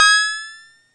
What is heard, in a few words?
tek beep up, electronic hits